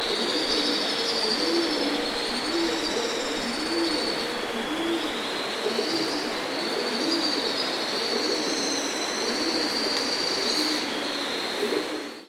felsentaube; Columba livia; Kuckuck

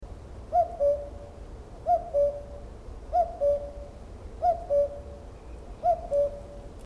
kuckuck; Cuculus canorus

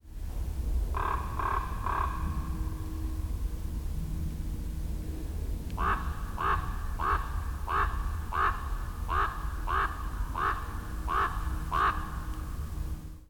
kolkrabe, vogelstimmen

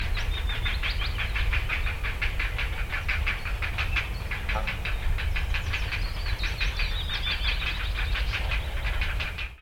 kormoran

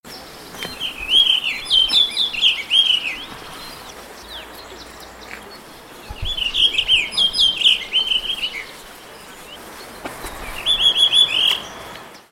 blaumerle

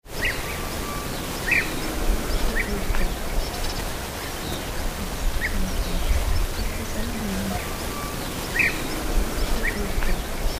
Bienenfresser; Merops apiaster

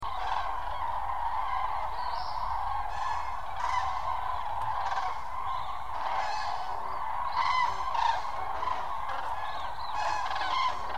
kranich

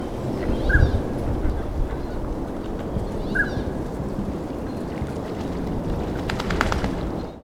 spiessente

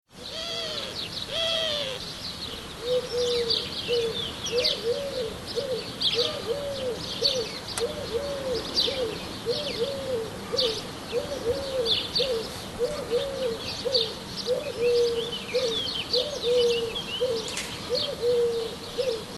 tuerkentaube

